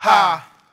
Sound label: "ha", Voice